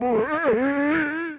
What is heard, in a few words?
Grunt